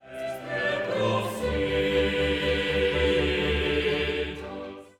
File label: Credo Est per prophetas